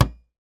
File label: household cabinet door close; zapsplat